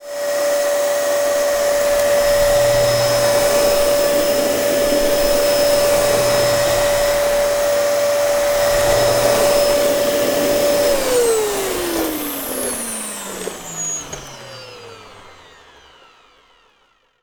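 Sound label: short sound vacuum cleaner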